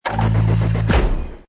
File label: Hi Tech Panel Servo Button